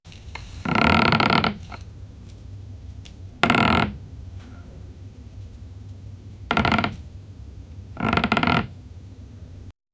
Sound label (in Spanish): sonido ambiente madera; ambiance